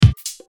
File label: Beat